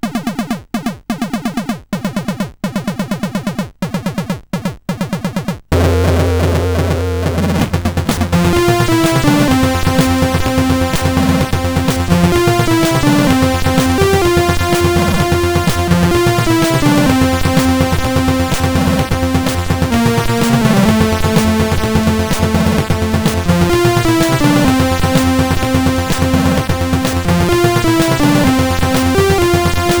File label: Nightflight sid CSG8580R5